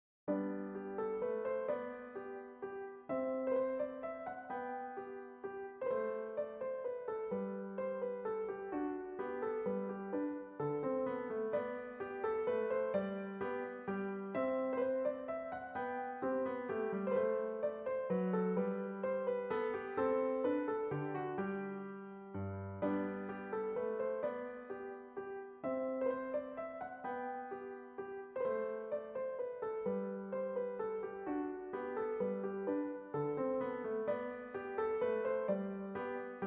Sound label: Minuet